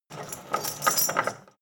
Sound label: drawbridge chain handle; soundpool